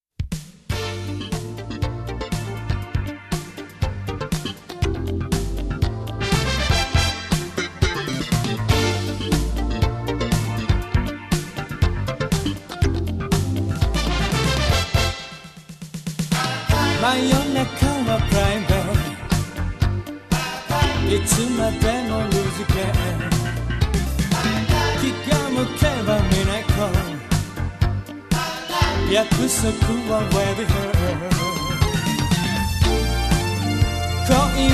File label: heat up beat